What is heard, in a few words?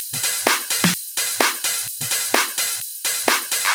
House loops 128BPM; Vengeance Samples